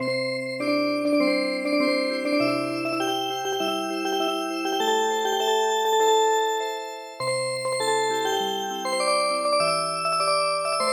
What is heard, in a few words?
Music Box, traditional